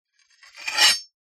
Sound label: warfare knife blade draw